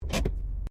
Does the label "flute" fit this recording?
No